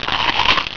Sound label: CRACK, breken